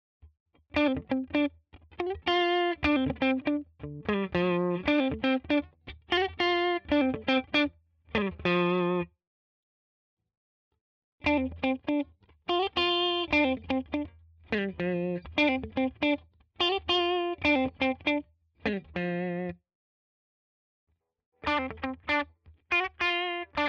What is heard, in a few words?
Schecter Exotic Ziricote clean single notes all positions